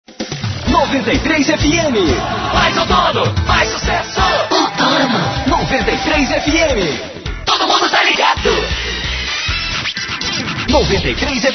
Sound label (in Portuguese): vinhetas outono